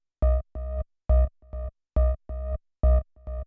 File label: ALARM, Techno 138 BPM; RED ALERT